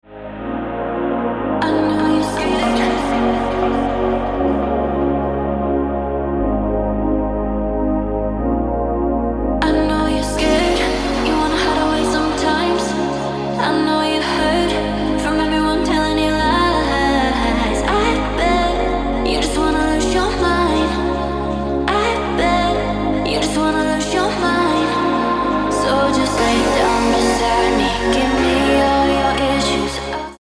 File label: Original Mix Ringtone